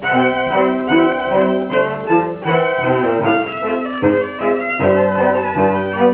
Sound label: ragtime; Scot Joplin